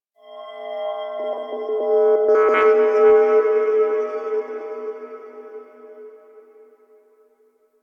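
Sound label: Glass Texture Medium, Ambience; Warframe; Cephalon Weave; DE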